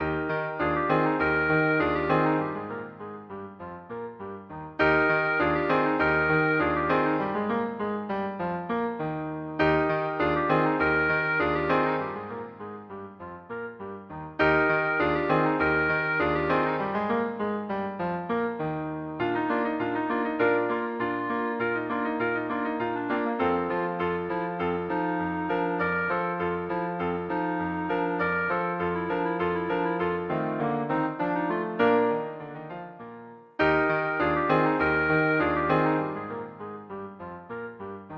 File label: musette, clarinet; bach